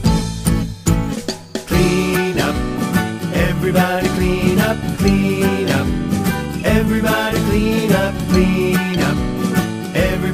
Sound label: Clean Up Song for Children - Kindergarten and Preschool Song